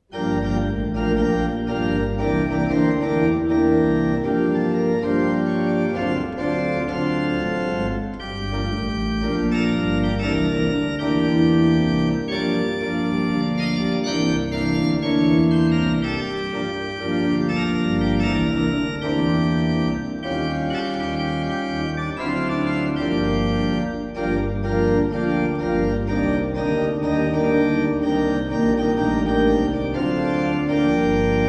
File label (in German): Orgel; Wade in the water